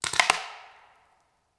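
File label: Paintball Gun Impact; Sound Ideas; Guns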